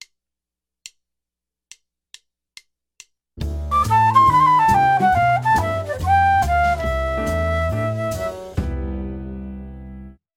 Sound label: Major Two Five dm7 g7 解決する